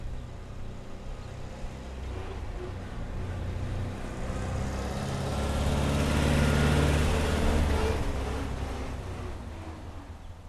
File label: Reverse